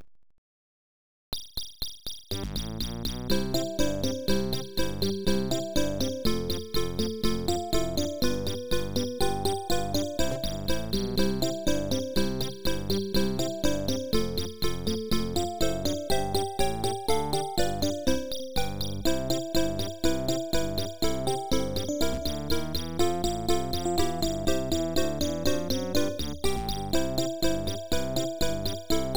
Bustling Street

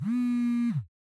Vibrate; The Joy of Creation Halloween

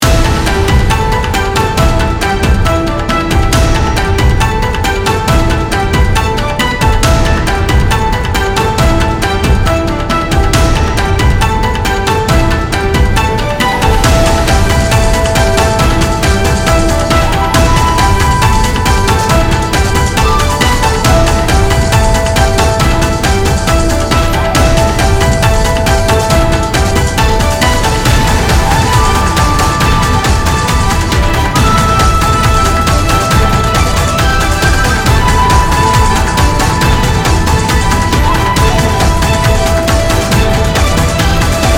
Raid Boss; Mist Train Girls

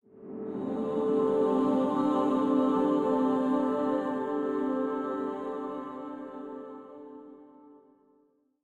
Stinger Auxilliary Laboratory

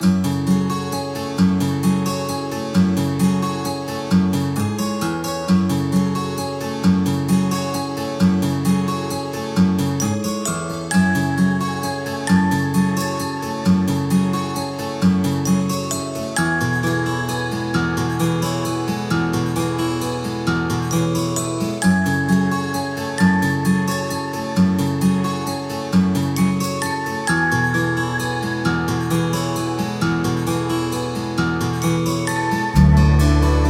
Grassland Theme

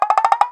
Stretch Sound Short

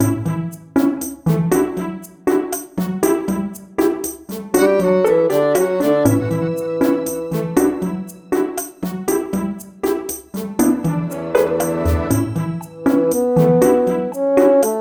Foothills; Sparklite

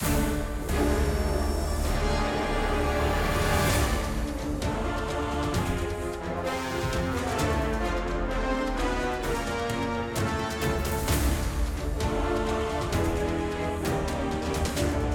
Battle Throne Room

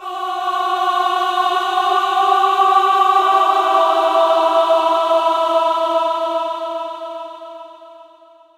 Choir; Forest

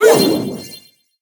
Magic Hit Opposition Players

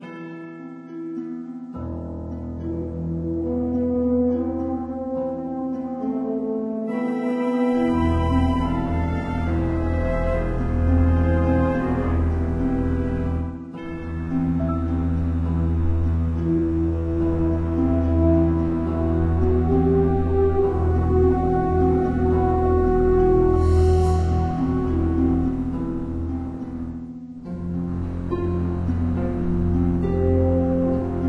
Study Music; Jewel Quest Solitaire

